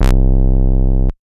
Synth Bass; Vengeance Samples; VEE Synth Shots

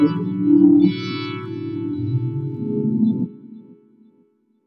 Synth Shots; Vengeance Samples

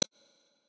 hat; Dubstep Sample Pack

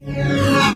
Tonal FX C#

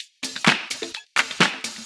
Houseloops 128BPM; Vengeance Samples

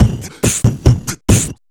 Beatbox Loops, Human Beatbox

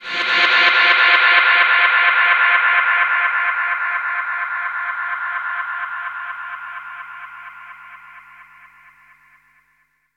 VFX Atmos

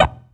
Minimal Perc; Minimal Percussion

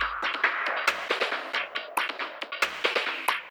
137BPM Loop; Drums & Perc; Vengeance Samples; Universe Kit; D minor

